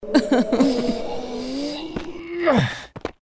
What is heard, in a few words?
Witch Blood Suck; Goddess of Trampling